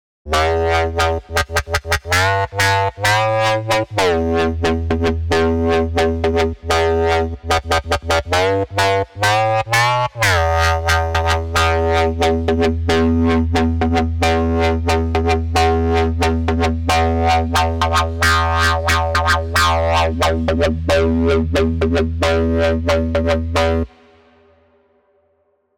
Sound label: arturia pigments